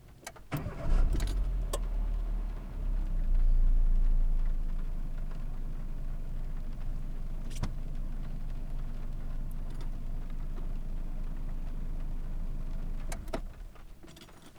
Starting and stopping car engine

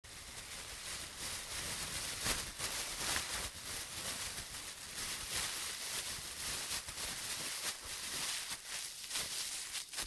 throwing plastic bag in the bin

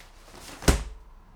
Putting up an umbrella

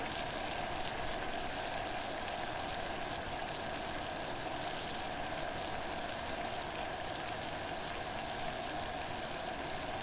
Boiling water

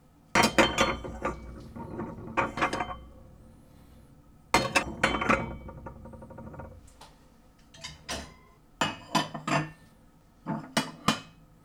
Plates

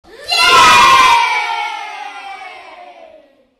Jeee

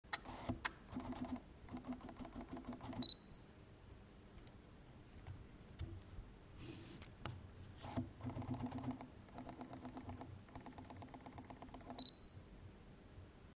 Focus beep

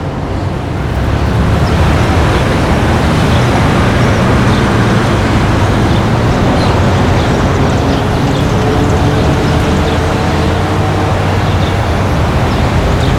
Street Sound

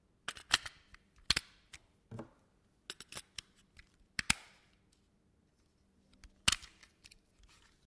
Battery grip

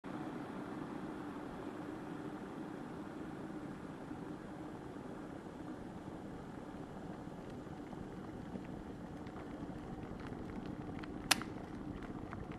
electric water boiler

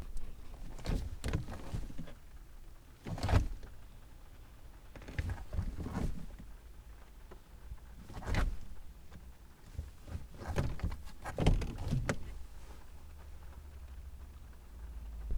Changing gears

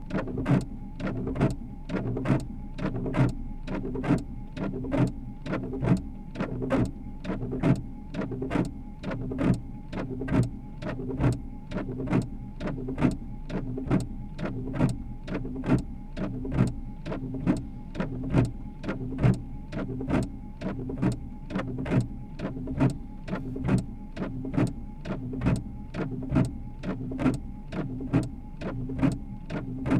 Windshield wipers

